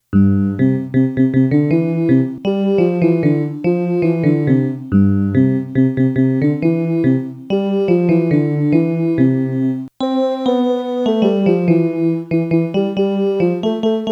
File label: ohi bella melody